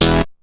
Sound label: Synth Hit E